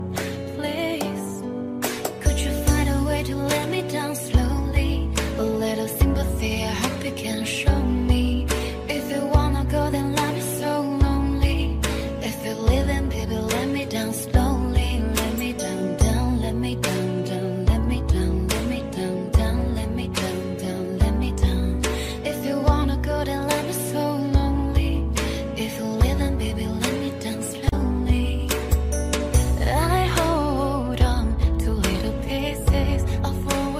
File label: Tik Tok sad ringtone